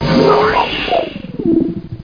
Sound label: JAM FIRE; XWINGTIE